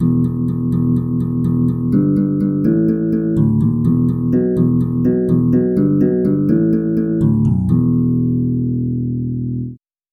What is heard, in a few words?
harp bass